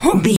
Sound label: voice male attack